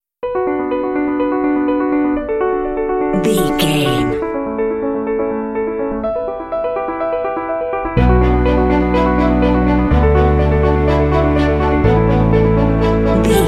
inspirational cinematic beauty and elegance

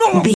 voice male hurt pain

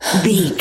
voice female breath run

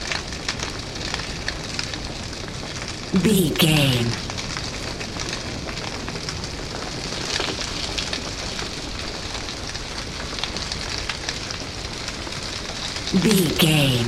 fire burning flames crackle loop

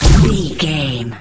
sci-fi weapon blaster laser boom

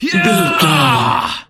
voice male battle shout

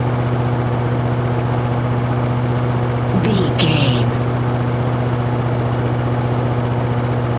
engine generator loop

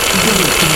gun auto barrel spin med loop

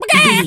chicken cluck scream long

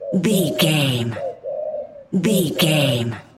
bird pigeon call loop

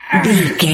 voice male effort action release

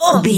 voice female hurt pain mild

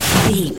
snow digging scooping shoveling

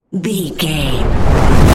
cinematic build up reverse whoosh

